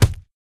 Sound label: chest hit; taunt; yeti; source engine; tf